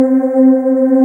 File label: VOICES, Roland JD8000; Sound & Vision - Gigapack I CD 2